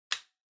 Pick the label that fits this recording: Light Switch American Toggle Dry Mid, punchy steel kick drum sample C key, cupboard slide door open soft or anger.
Light Switch American Toggle Dry Mid